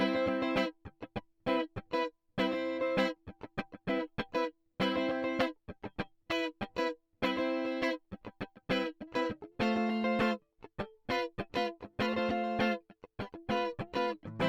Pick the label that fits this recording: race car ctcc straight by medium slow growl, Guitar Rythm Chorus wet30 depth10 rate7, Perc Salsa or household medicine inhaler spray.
Guitar Rythm Chorus wet30 depth10 rate7